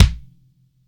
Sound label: MIX KICK, TIGHT KICK